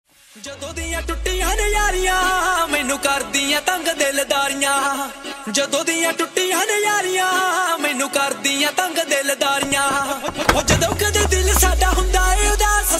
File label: sad song